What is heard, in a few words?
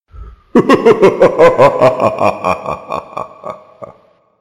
Evil Laughing Man Halloween Sounds Car Horn